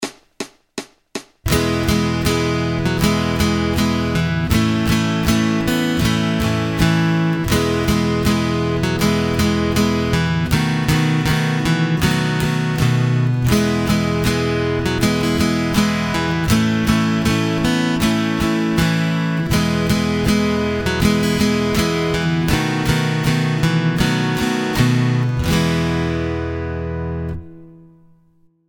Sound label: Acoustic Accompaniment, acoustic guitar